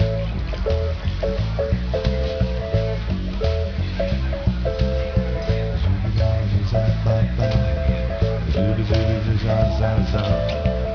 muzak

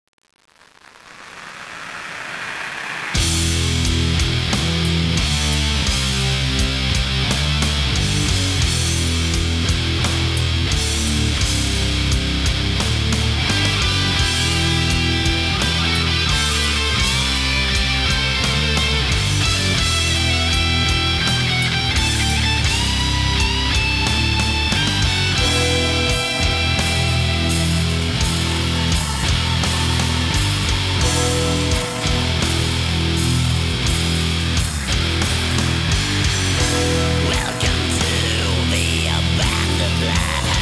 rai

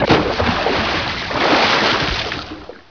splash; de shooting

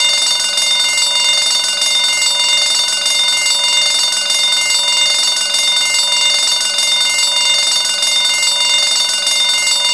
bank alarm

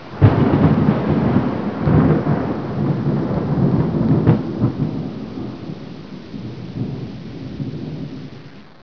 thunder rumble; cs ~el~azteca v1b

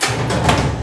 door metal gate close; de submarine

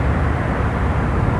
City, ambience

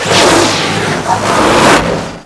open; de stargate atlantis

